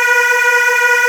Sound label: Aahs Db3